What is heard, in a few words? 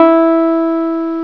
synthe